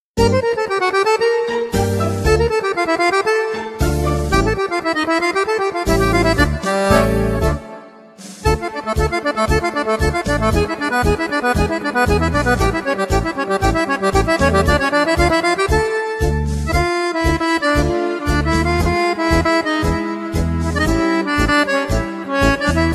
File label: tango nuevo; tango fisa